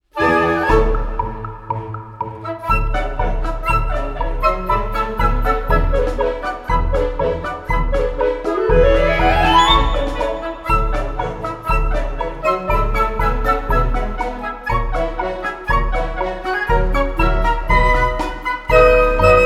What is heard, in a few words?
Tritsch-Tratsch-Polka; takt op. Symphony; Music Collection IV; Tea Break; Op.214